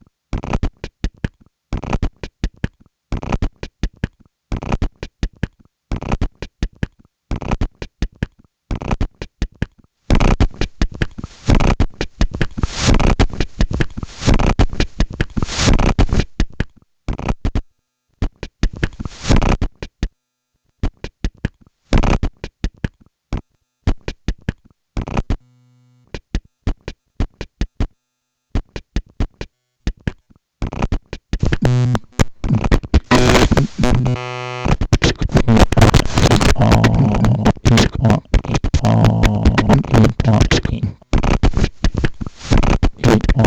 Circuitbent Yamaha SU10

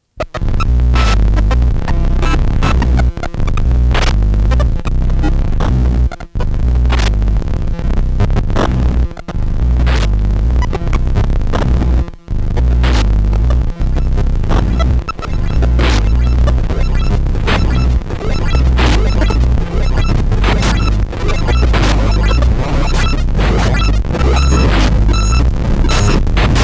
1bit groove; Gijs Gieskes

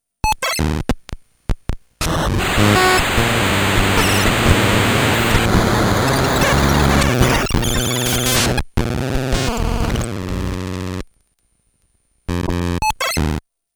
generative gb, prepared gameboy

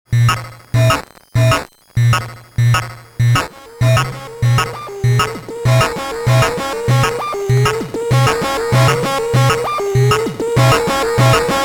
Pieper thru 3x HSS2020

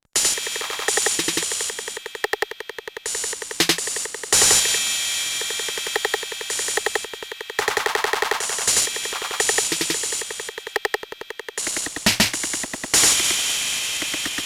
808 time stretch

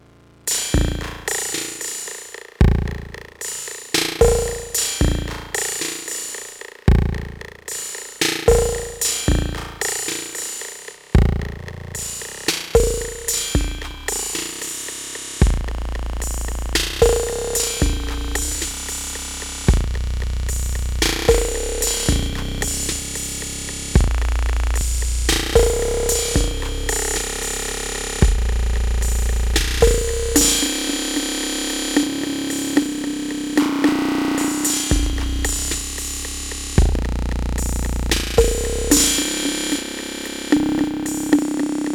808 delay threshold

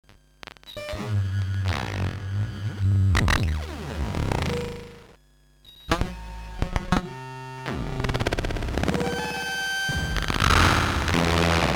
feedback, hard soft synth

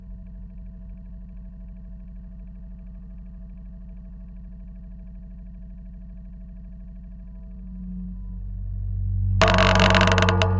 motor magnet spring piezo

